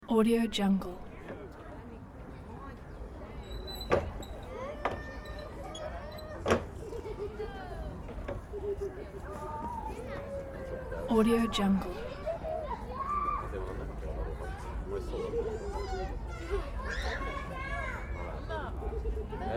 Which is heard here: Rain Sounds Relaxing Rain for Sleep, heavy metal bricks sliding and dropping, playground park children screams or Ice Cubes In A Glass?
playground park children screams